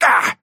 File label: effort melee small; Trapper